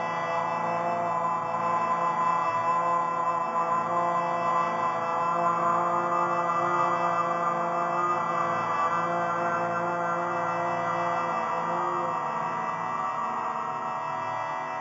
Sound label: drone enemy